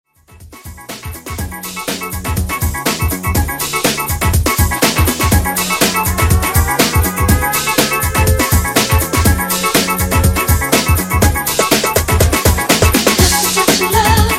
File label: Extended Redrum, 122Bpm